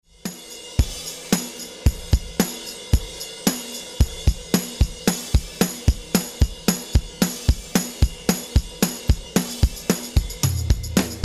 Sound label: wet drums